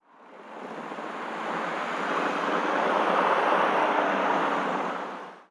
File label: office outside driveby, ambient atmosphere